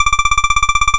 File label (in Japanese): 会話; nes retro talk sound effects